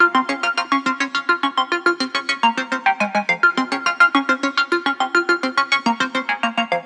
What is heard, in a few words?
Riddim Melodic Loop F 140 Dawn; Ghosthack; Riddim Essentials Volume 2